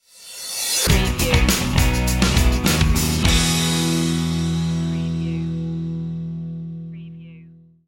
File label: free and easy stinger